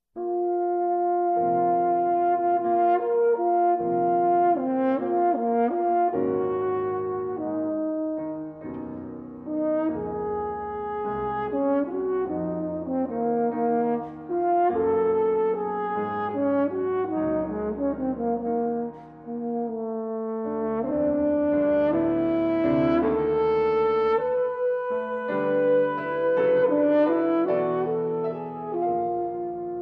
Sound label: Sonata For Alto Horn In E Flat & Piano, Ruhig Bewegt